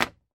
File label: office chalk stick place down on surface; sound effects one